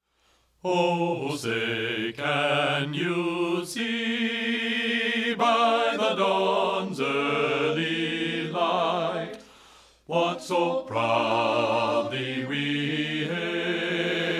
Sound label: BASS; THE STAR SPANGLED BANNER